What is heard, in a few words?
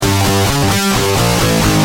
G Pump, Guitar; Industrial